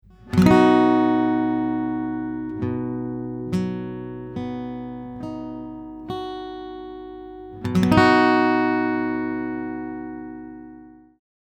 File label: B Major, guitar